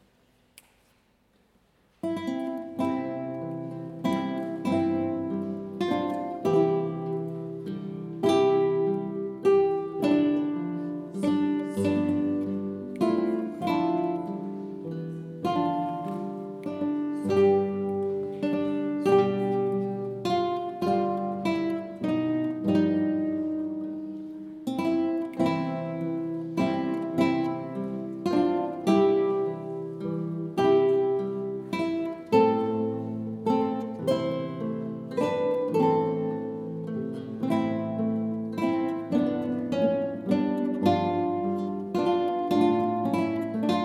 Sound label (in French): Guitares Moyens